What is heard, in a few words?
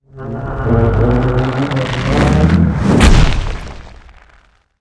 tree boss attack, monster